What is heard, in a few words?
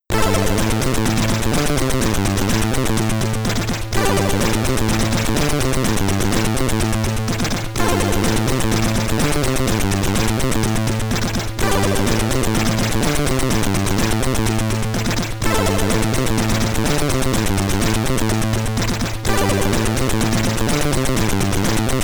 Main BGM, commodore 64